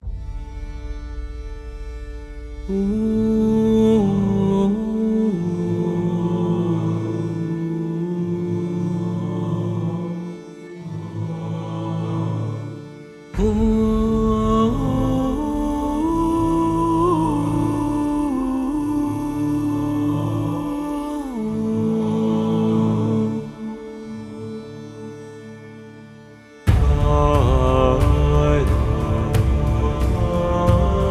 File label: trailer music